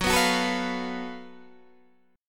F sharp Minor Major 7th Double Flat 5th, Guitar, DADGAD; chordshape; down